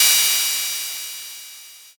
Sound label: cymbal